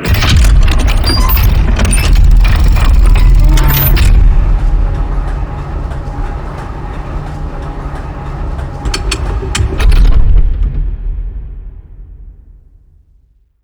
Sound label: chain door open giant